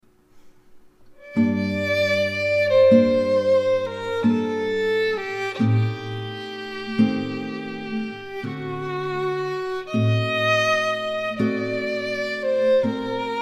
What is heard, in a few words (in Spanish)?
canción albinoni interpretada por violin y guitarra